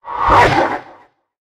Attack

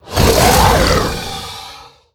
Pit Crocolisk Attack

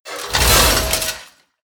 Armor Type A Attack Underlay

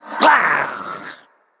Male Murloc Ghost Attack

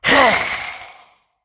Skeletal Smith Attack

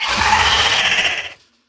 Wasteland Scorpid Attack

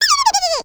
Enter Play

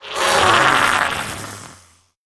Plush Bear Death

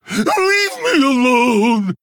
male orc, Attack

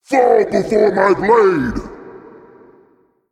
Male Titan Attack

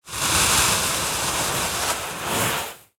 Fuse Death Underlay

